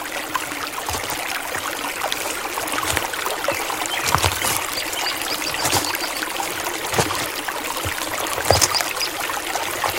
Hike near river

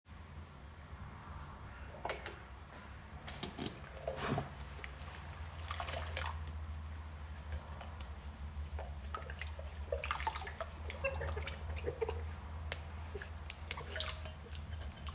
Washing dishes

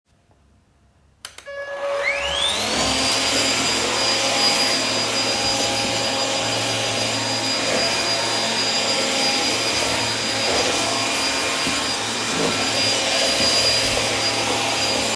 Vacuum

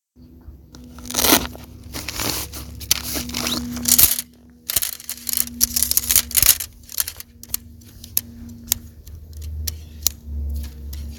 Tent poles

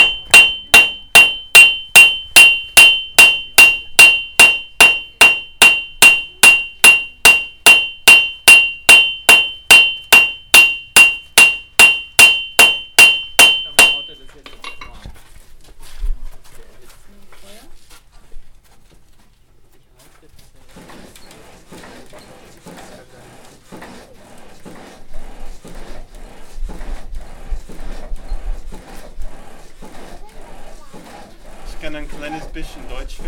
At the blacksmith

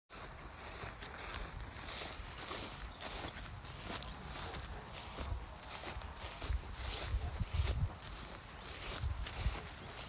Shoes in the grass

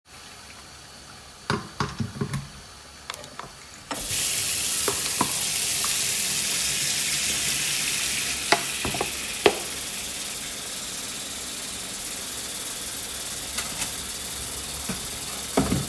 Water in the sink

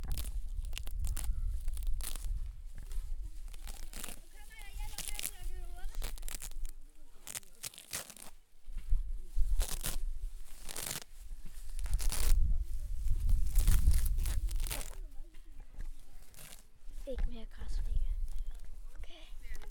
Picking herbs

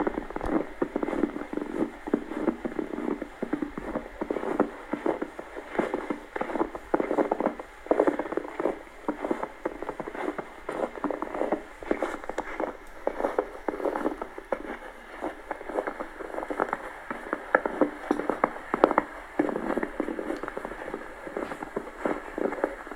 walking in snow

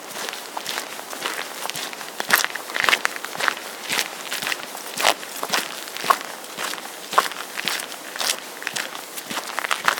Forest road gravel and grass

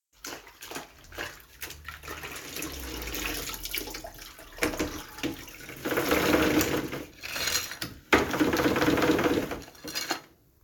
Mopping

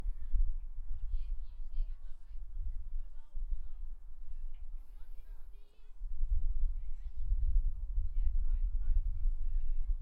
Village sounds

